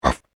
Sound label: Dog, Animal Impersonation